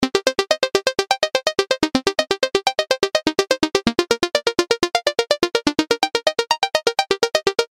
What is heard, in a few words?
Sms Trance